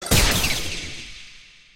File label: auto match